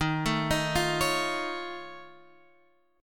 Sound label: F Augmented 7th D sharp, chord